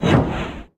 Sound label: thought route vanish